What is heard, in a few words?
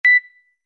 beep; woocommerce point of sale